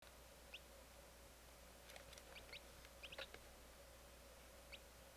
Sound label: Peep Series, rat